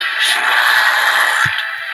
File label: snooooooort